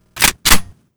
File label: shot pump